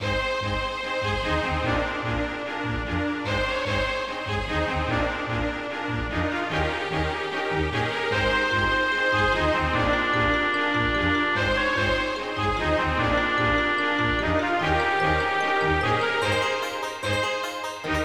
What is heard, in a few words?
tune